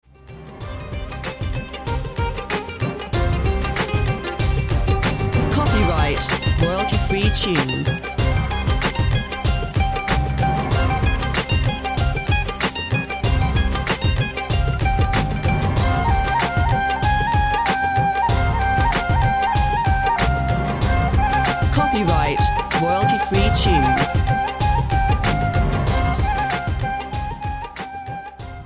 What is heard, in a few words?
Urban Bhangra